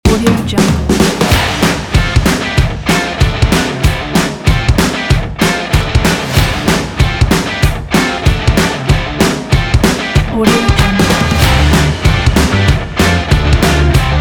rocking sport

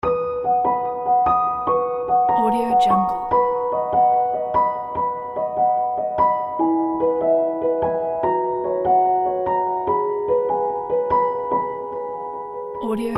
magic piano

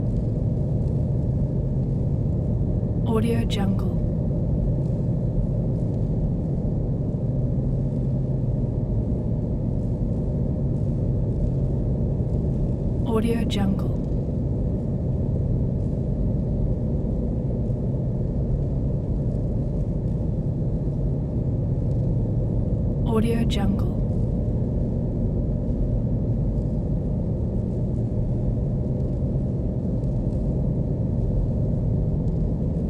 doom drones factory abandonned; doom drones 2; soundmorph

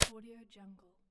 scifi weapon magazine insert

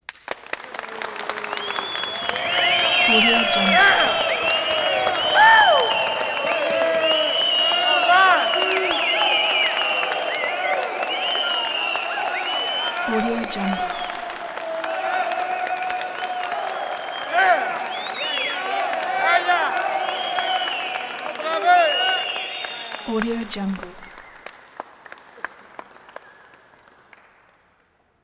crowd applause reverberant theater

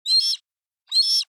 animal bird hawk young chirp

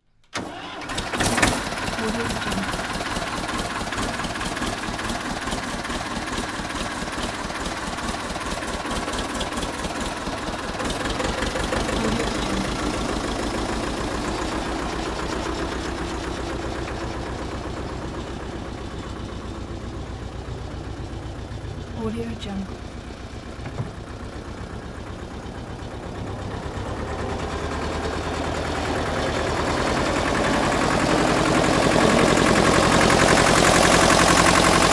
vintage tractor start and drive out of building

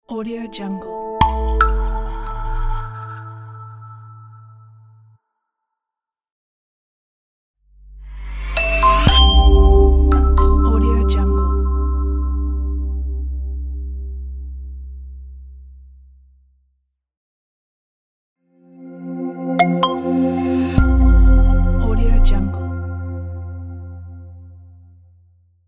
minimal quick logo pack

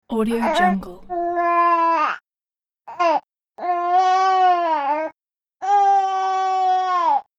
baby crying sad

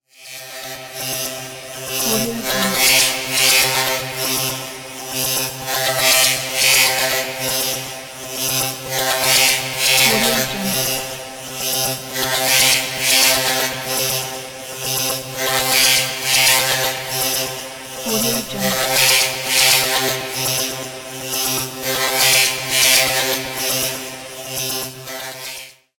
distorted movement drone

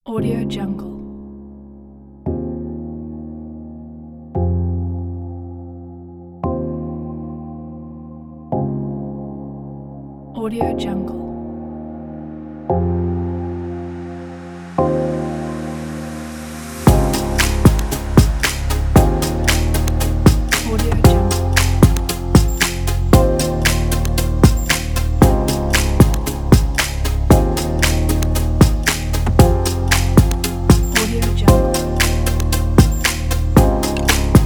electronic and percussion

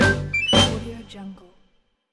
flutey win

